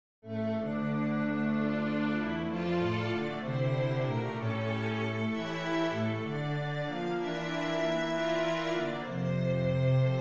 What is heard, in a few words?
elegie, string bass